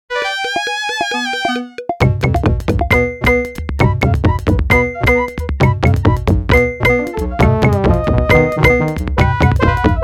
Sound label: Jungbeat